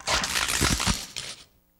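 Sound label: bone crack; E-MU Producer Series Vol. 3 – Hollywood Sound Effects; Science Fiction; Pumpkin Head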